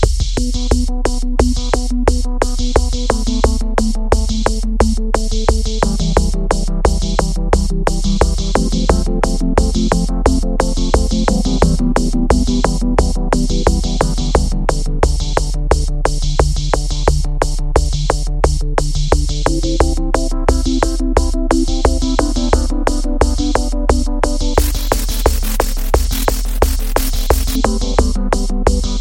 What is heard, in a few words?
Chill ring tone